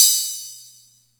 Music Percussion Computer HH open, Drum Machines

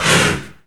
DANCE FX

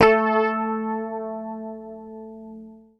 PICK, Bass Library; Sampleheads; Will Lee Bass Library

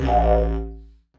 Didgeridoo Kit Samples

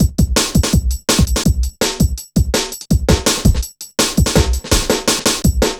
Programmed Stepz, Jungle Drum Loops

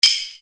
Percussion, EuroDance

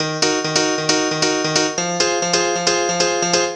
Rave Piano

